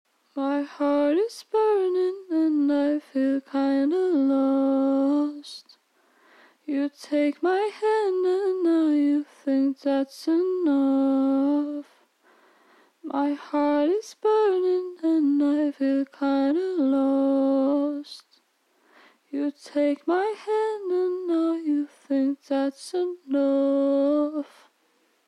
clean vocals broken portastudio

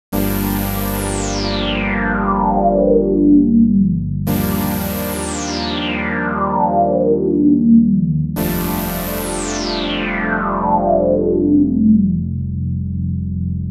LPF24 high Q sweep synth

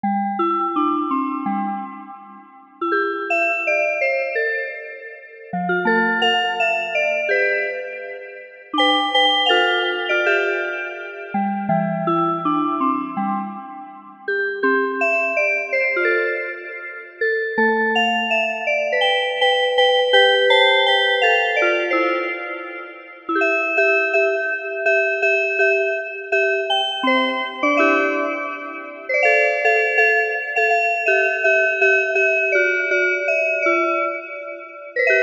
jingle bells; porphyra hybrid by ocean swift synthesis

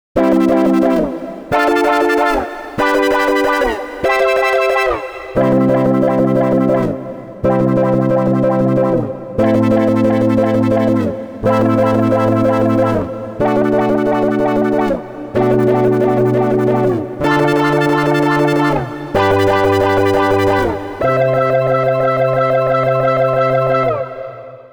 shark attack seq; the digital life

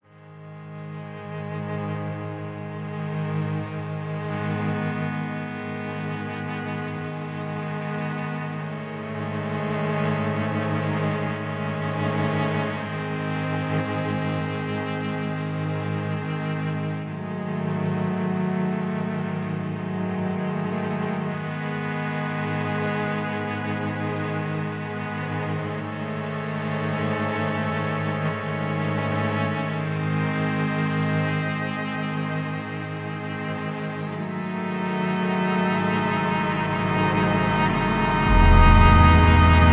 cello; intimate cello; gossamer; bunker samples

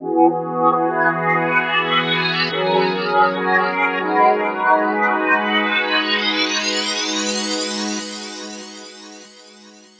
smooth pad dry; kern audio

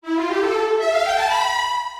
bollywood string; ultimate vital bundle; rocket powered sound

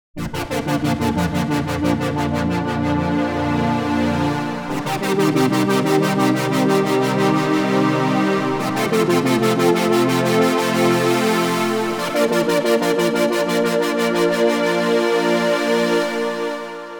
hold a chord for a moment; the digital music

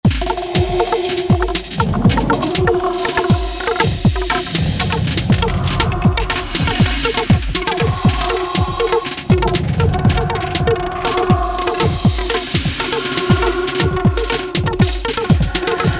drums

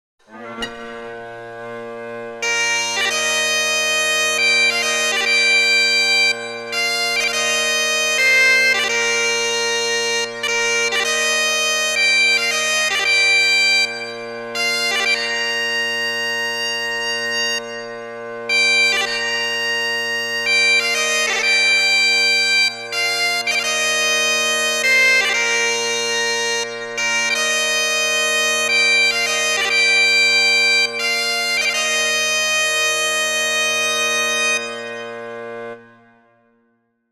amazing grace solo bagpipe; celtic pipes; Celtic Pipes V3; Logic Pro; MainStage; Bolder Sounds

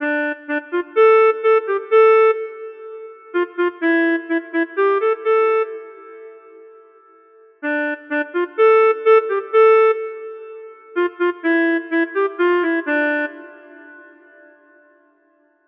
melodica loop; etheral melodica; soundfingers

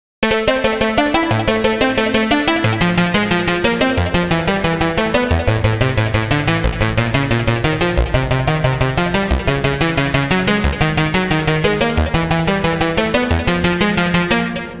promethium arp; the space soundset

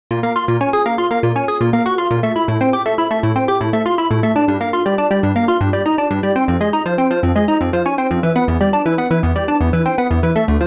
ladder filter; the analog sound waves

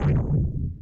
alien select menu click thud; navigation button fx; nova sound

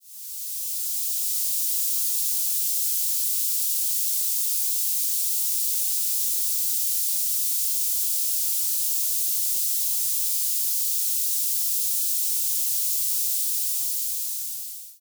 fizzing; noisepalette; sirenfx

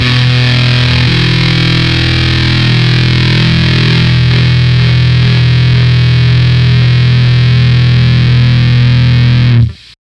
gtr, Fuzz test; frenchtoast

